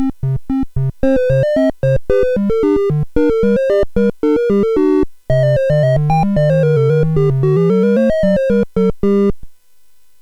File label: sid CSG8580R5; Floyd of the Jungle